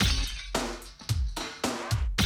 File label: Classic DrumLoops, 110